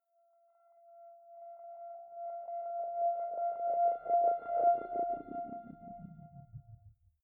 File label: static string high; risers